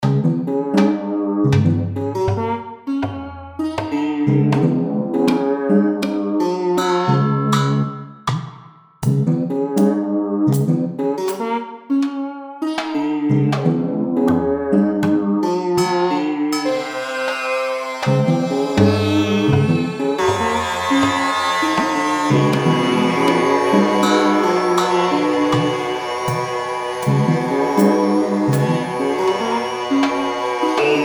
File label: orchestral 11edo